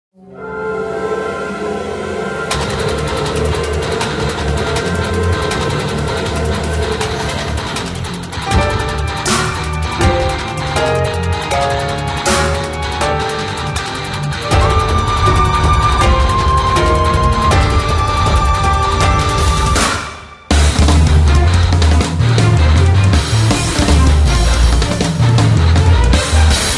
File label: Battle BGM